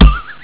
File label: bong; xfce